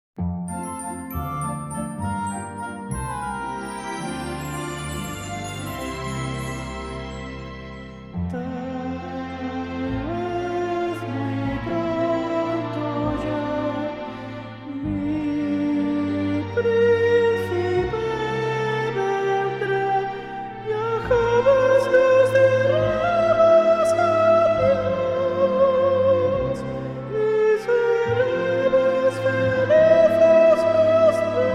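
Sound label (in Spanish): sopranos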